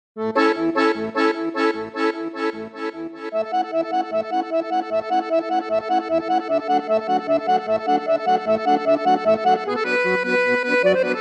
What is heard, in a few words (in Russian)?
Rumynskaya narodnaya melodiya Zavoronok